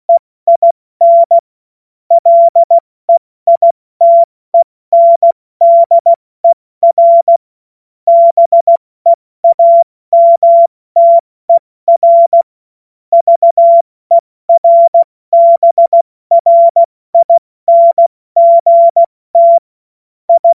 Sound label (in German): Witz, cw morsen; Tempo 70 F80